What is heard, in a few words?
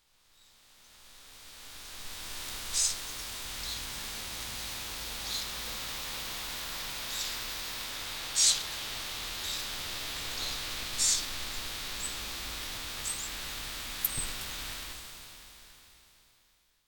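Yellow-bellied Tit; kibaragara; miyazaki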